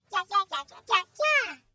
dance fly

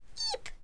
eep

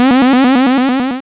magician transform

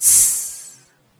extinguish

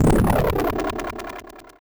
use portal

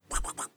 eat

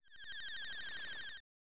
DIZZY; SFX; Airport Runer

